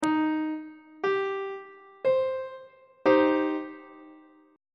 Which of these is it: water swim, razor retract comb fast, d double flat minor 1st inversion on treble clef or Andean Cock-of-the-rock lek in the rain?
d double flat minor 1st inversion on treble clef